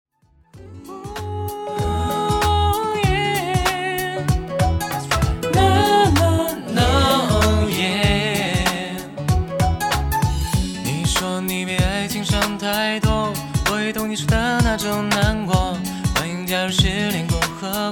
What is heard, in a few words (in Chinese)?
翻唱感觉